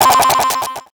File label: Animation Electronic; Multimedia Internet CD-Rom Flash